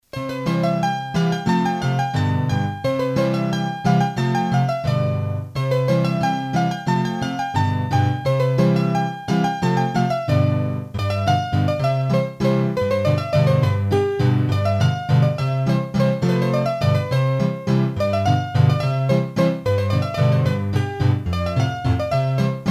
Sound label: Scottische Valse, hurdy gurdy; Massif Central